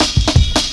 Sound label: Drum Loops, Jungle